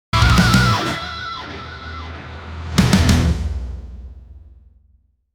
94 bpm Guitar Shocker, instrumental; 05 sec; ai social media music

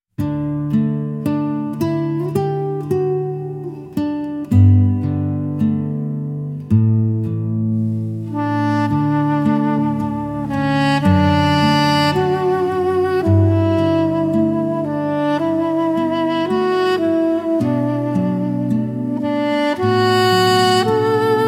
Amazing Grace, weihnachtslieder instrumental

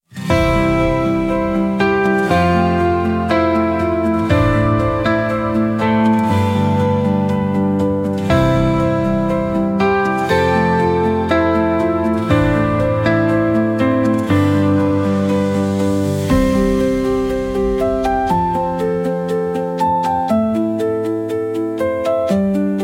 120 bpm Moody Guitar Melody Hall, instrumental; 38 sec; ai social media music

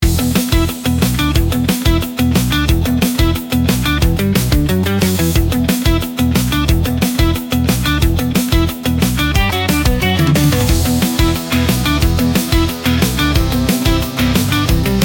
90 bpm Guitar Synth Arpeggio, instrumental; ai social media music

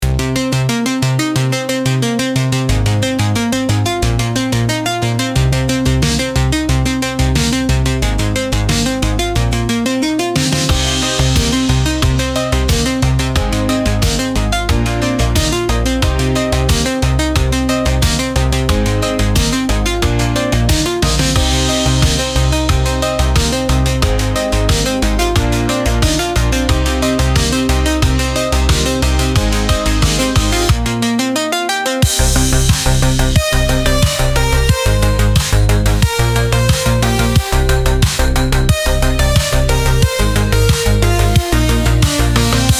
16 Bit 80s Gaming Sound; ai social media music

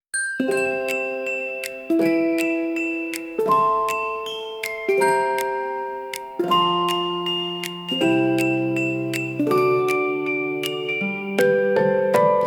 Jingle Bells (Instrumental); weihnachtslieder instrumental; ki musik

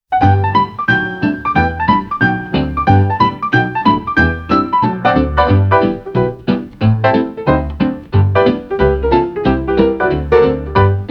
Old Gramophone Swing; instrumental; instrumental ai music

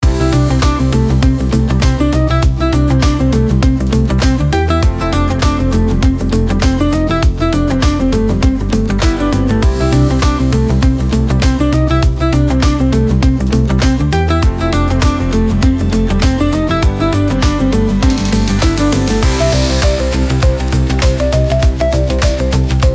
100 bpm Guitar Arpeggio Synth, instrumental; 52 sec; ai social media music

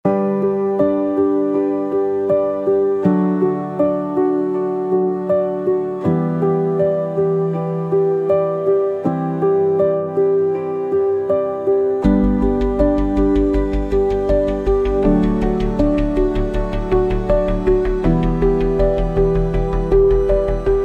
80 bpm Piano Guitar Synth Melody, instrumental; 62 sec; ai social media music

